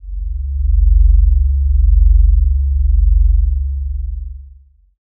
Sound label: space drone